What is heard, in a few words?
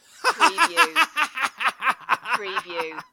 Laugh Male, Laughter, Laughing Male